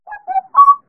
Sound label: kamikaze; fowl-play; timmy; enemies